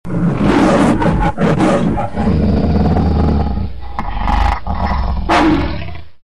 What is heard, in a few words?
Lion roar